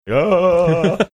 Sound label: making weirded out sound